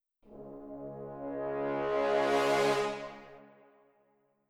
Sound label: horns; Netease